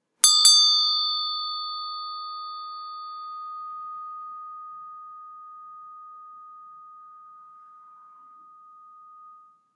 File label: bell